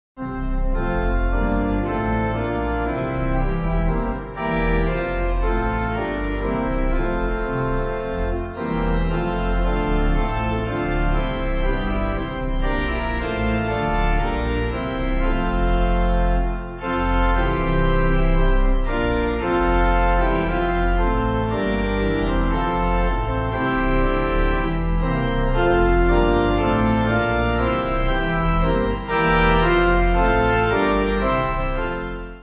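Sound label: Pipe, Pipe Organ backing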